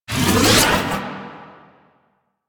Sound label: Archon Whip Block Start; Enemies; Weapons; Warframe; The New War; Nira